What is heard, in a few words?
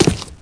step, footstep, pave; SINDEMO